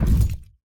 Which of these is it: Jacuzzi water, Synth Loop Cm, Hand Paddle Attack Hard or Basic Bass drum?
Hand Paddle Attack Hard